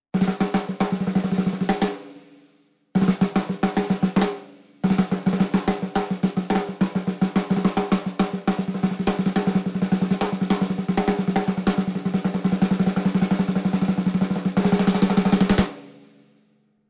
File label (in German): Snaredrum alleine; Fame MS Drumkit EZ